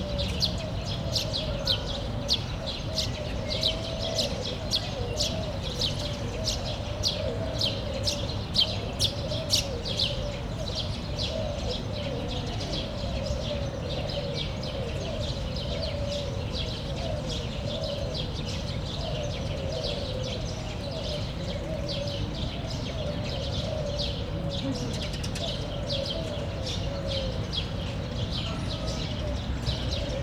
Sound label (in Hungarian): nehany belőluk, hazi vereb; debreceni zoo 2012